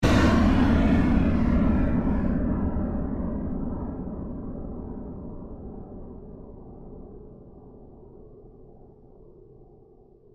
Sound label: Created Surge Fade Slow, Rise And Dive Sound Effects; sound building blocks created through manipulation of raw material